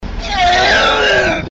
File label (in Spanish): vomito reverse